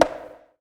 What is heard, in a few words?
CONGA; MLOP DRUM; E-Lab; X Static Goldmine 2; akai